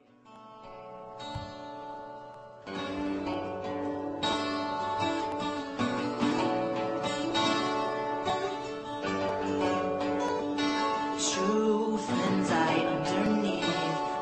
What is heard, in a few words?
acoustic